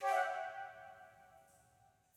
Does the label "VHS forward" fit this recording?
No